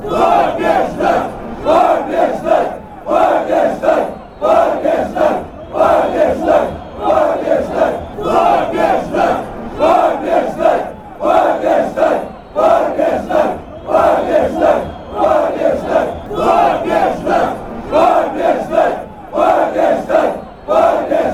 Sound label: win, fanchants; cska